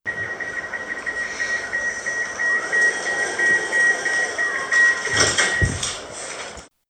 Hovedstadens Letbane: Døråbning; Nikolaj Barfoed-Thaysen, Oktober 2025

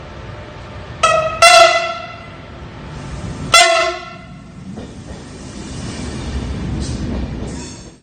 tyfon

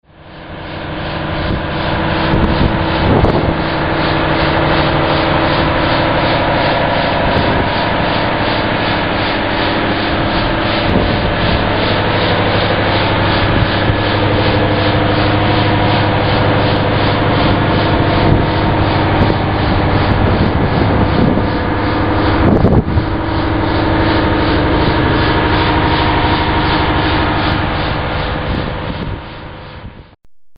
ma motor

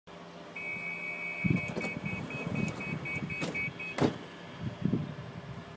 sa door luk; S-tog Litra SA dørlukning; 2012, 4. generations S-tog; Michael Hansen

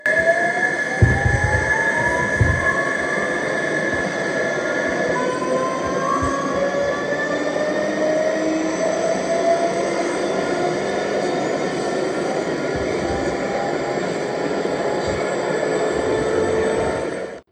Hovedstadens Letbane: Acceleration; Nikolaj Barfoed-Thaysen, Oktober 2025

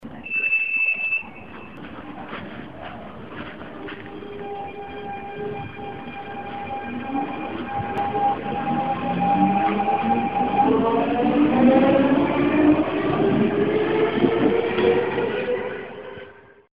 s-tog; S-tog #2

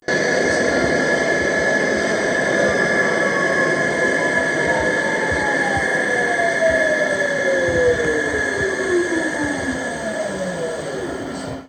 Hovedstadens Letbane: De-acceleration; Nikolaj Barfoed-Thaysen, Oktober 2025

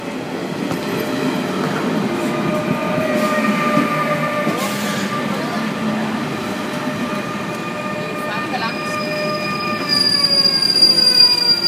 ir4 ankomst, toglyde

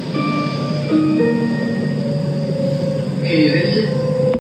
hoevelte dsb jingl

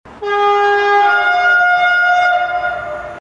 mf tyfon

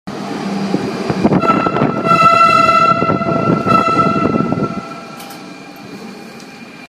stog tyfon tunnel; S-tog tyfon #3; 4. generations S-tog (Litra SA).

